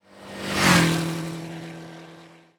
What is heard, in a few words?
car, highway